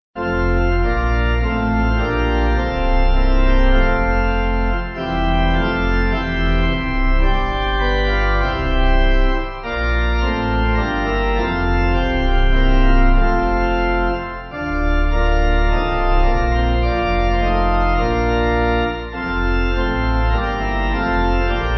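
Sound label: Pipe, pipe organ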